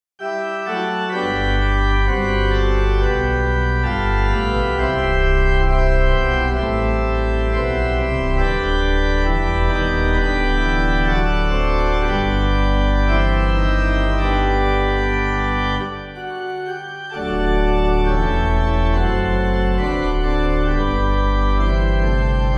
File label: Pipe; HeIsLord